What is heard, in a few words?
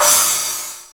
drm c#2, DRUMCOMPUTER, KORG DDD-1; Retro Sampler